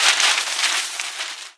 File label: Core Paper Fly